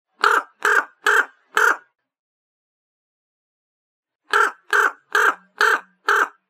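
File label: raven; kruk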